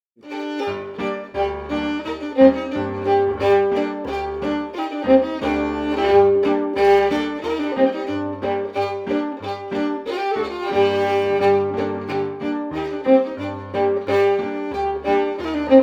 FIDDLE PIANO; Jink & Diddle Book o' Tunes 2022

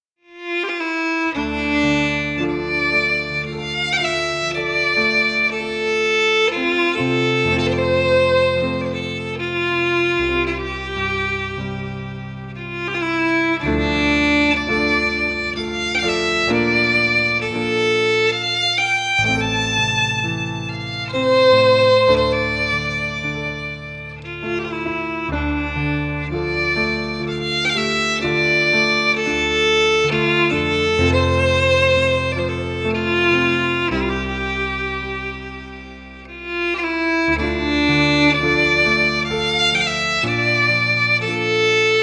Lament FIDDLE PIANO; Jink & Diddle Book o' Tunes 2022

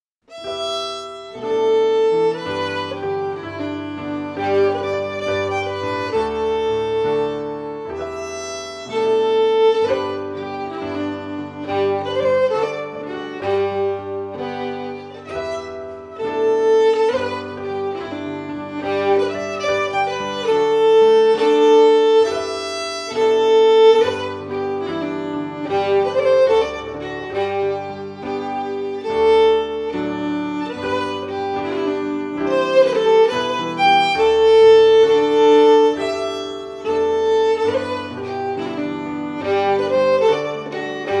FIDDLE PIANO; Jink & Diddle Book o' Tunes 2022

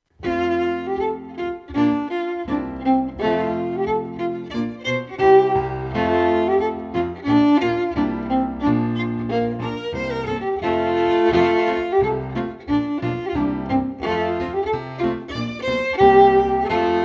Violin; Jink & Diddle Book o' Tunes 2018

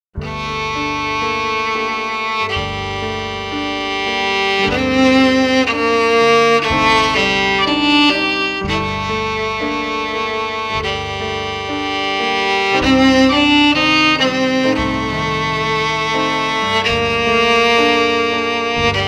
FIDDLE PIANO; Jink & Diddle Book o' Tunes 2022

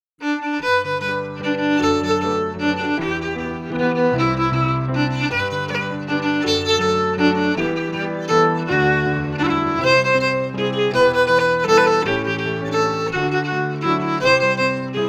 FIDDLE FIDDLE; Jink & Diddle Book o' Tunes 2022

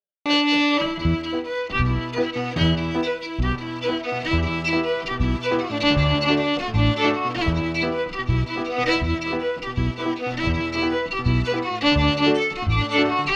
FIDDLE PIANO; Jink & Diddle Book o' Tunes 2022

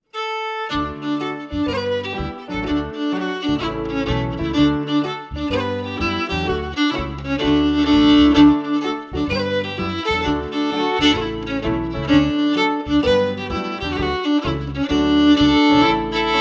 Jig FIDDLE PIANO; Jink & Diddle Book o' Tunes 2022

